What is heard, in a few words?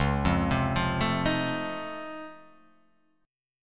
C sharp Augmented Major 9th down, guitar chord